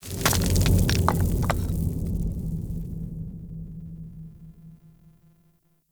Town Stone Debris; Ambience; Warframe; Duviri

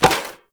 Sword And Board Catch; Weapons; Player; Swords; Sphere Sword And Board

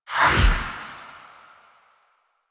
Light Teleport; Warframe; Wisp

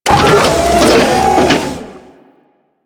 Turret Open; Warframe; Machines; Auto Turret

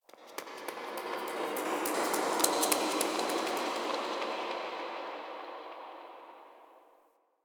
Memory Echoes

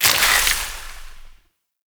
Void Hit

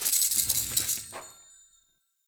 Unearth B; Encounters; Treasure Hunt; Sproutfolk

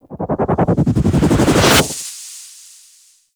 Ghost Comet Proj; M3 Void Arena; Warframe; Wraith Quest

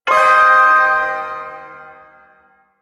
Ersatz Shawzin Pent Min Chord; Warframe; Duviri Ersatz Shawzin

